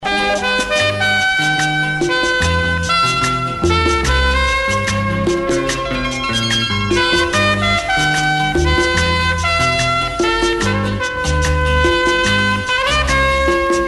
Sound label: ringtone